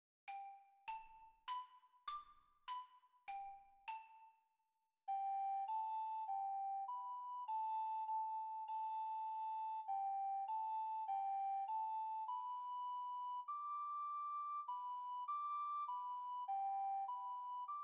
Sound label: Skating Along